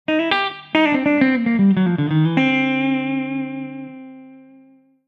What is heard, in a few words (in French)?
Country, Guitare Country; methode guitare country